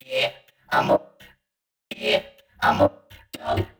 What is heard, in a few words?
126 C VOCAL LOOP, Funky Underground; Loopmasters; Funky Underground Vol 2